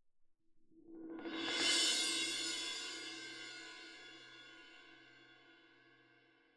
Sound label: Cymb Close